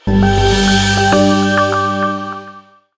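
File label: Colosseum Boss Event Appear; Fly For Insanity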